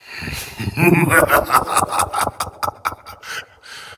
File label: Laugh; League of Legends; Pyke; a sinister chuckle